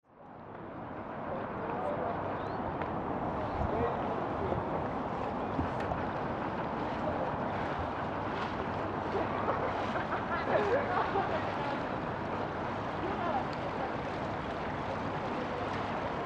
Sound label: boat trip